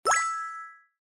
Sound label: cook finish; MiaoDaren